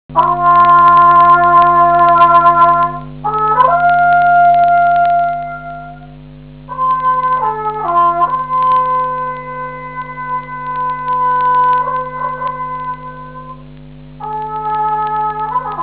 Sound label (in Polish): hejnal